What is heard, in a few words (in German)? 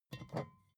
bottle vodka pick up grab, gastronomie